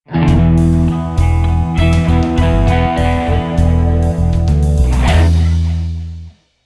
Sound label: round lose zombie; music